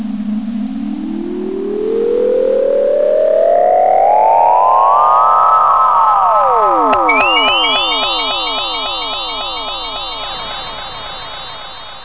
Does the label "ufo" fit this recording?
Yes